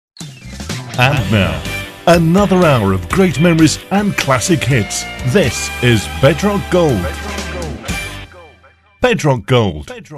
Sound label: GOLD JINGLES; BEDROCK